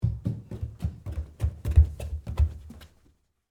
Stairs Down Wood, Footsteps, Stairs, Down, Hard, Wood, Barefoot, Child, Fast, Pace; BlastWave